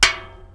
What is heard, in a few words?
metal; Mafie 1